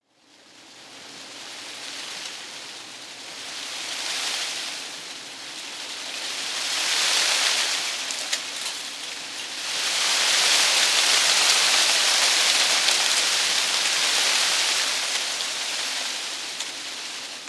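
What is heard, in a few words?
tree foliage rustle heavy, garten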